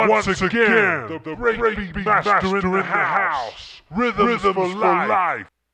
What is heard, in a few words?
Hardcore Breakbeat, 151; Big Fish Audio; Hardcore Breakbeat Action; Rhythms 4 Life 151 Ebmin